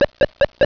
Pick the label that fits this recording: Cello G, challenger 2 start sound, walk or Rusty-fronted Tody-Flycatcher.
walk